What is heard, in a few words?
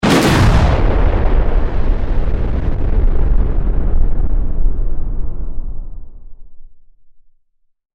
Science Fiction Explosion Rumble Crackles; Rumbles; Sound Design; Sound Effects:Sound Design